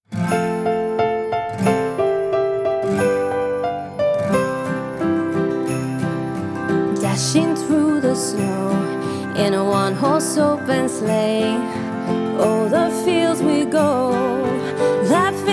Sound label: Jingle Bells